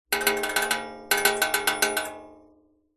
foley metal thin object short fast rattle clatter larger metal frame